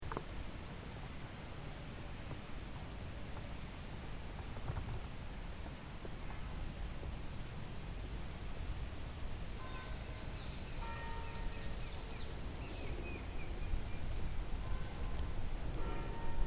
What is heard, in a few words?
Dead Leaves, field recordings